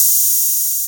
volca beats hat open; Korg Volca Beats

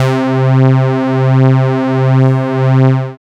single-synth, techno, c; Techno ID

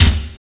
CYBER KIT, DRUMS

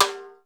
DRY P TIMB, drum; Crypton - DrumBase5000; DRY KITS

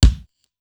SDS2000 BD, Simmons SDS2000; SDS2000 Factory3

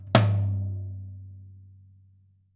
toms, drums; Living Drums; Sampleheads; Peter Erskine

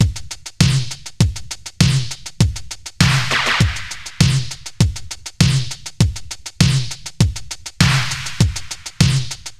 100 Beat; Blackout; Zero-G - Future Wave

